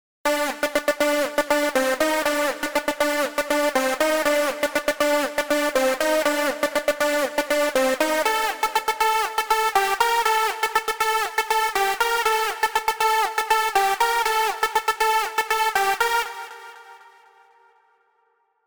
Voice Glider

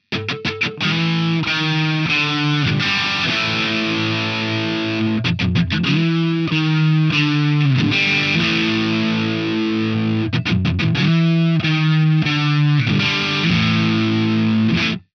Gibson; OD; TAK-DC

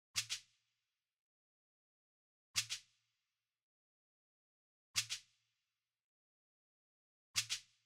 Cabasa; Stylus

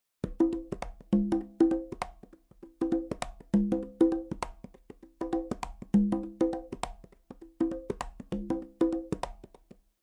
Conga Real

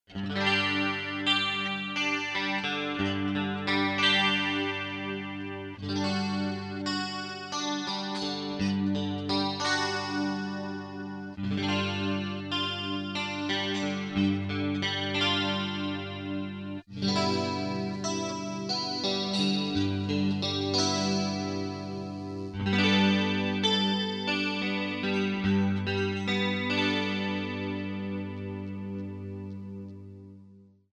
CLEAN Fender Japan Stratcaster

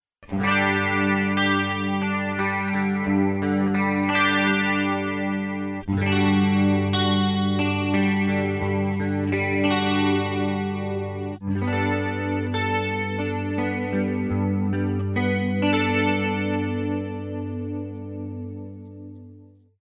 CLEAN YAMAHA SA2000S

